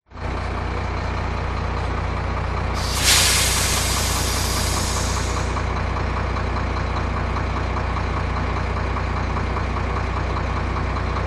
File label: Dump Trck Idl